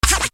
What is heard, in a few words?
TALK SCRATCH, Yamaha RY-30